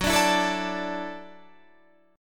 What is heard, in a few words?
F sharp Minor 7th Sharp 5th F sharp, Guitar Standard; 14,17,14,14,15,17; strummed; capo=3